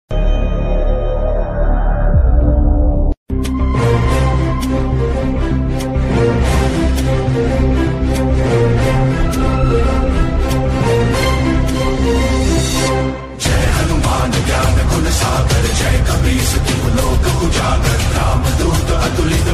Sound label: hanuman chalisa ringtone instrumental ringtone